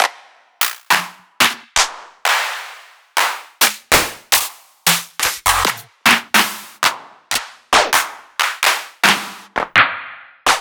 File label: Claps; Electronisounds